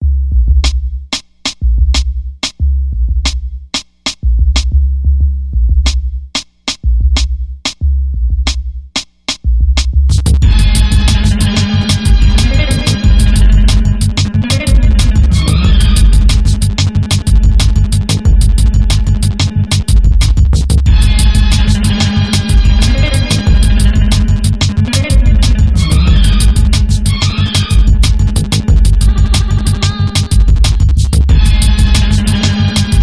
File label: beat